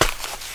sneak dirt, footsteps; 1000fx

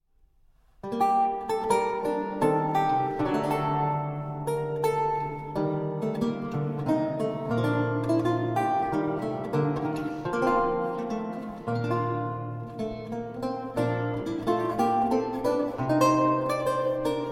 Gagliarda, Archlute

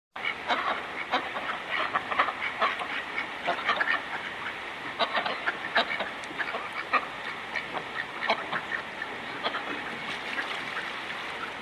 Ducks; SOUND EFFECTS1

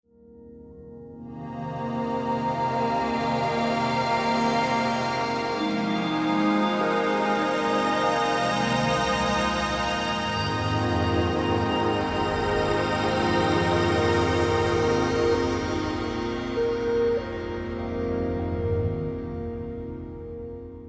Chord Wash; Beds

